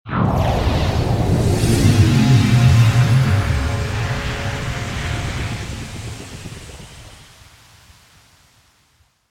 Building Purify Medium; Level6; Journey

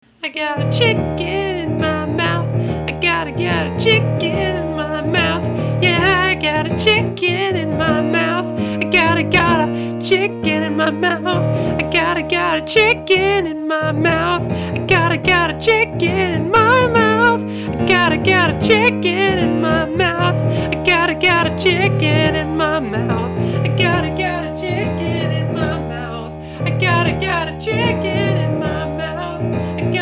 Esophagus Clucking

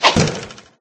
dagger; There; ThereClient

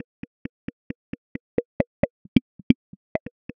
BLIP; ACID Pro 6.0

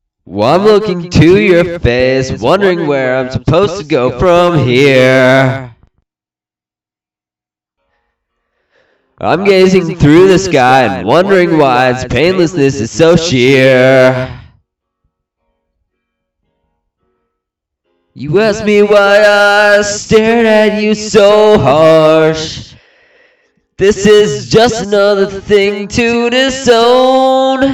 Vocals Echo

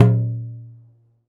Tabla; Drums; Misc Percussion

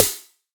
Snare Electrified

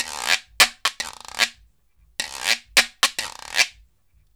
Guiro 110 bpm; Loops; Drums; Percussion; Ableton Live 12 Lite; Core Library

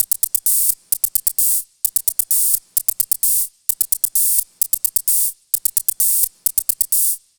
Hihat Electronic 130 bpm; Loops; Drums; Top; Electronic Essentials; Ableton Live 12 Lite; Core Library

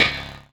FX Strike Doubled; FX Hit; Drums; Ableton Live 12 Lite; Core Library

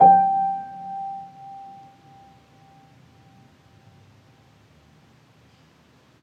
Upright Home Piano G4; Multisamples; Ableton Live 12 Lite; Core Library

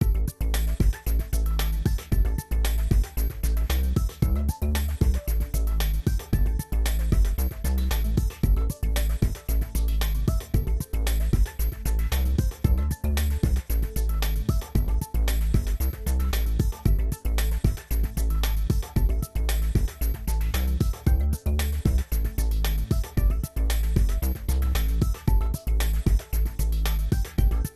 Groove; Microsoft IntelliPoint

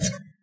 bump; There; ThereClient

